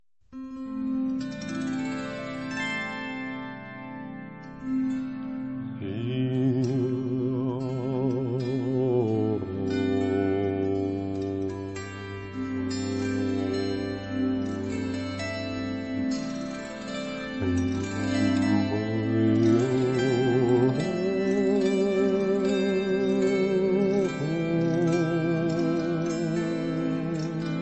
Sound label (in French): Chant du reconfort, Relaxation